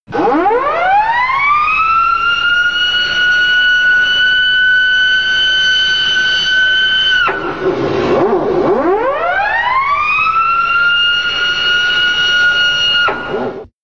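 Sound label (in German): Zerstörer Sirene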